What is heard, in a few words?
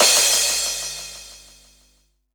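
cymbal, dance drums; Best Service ProSamples vol.15; AKAI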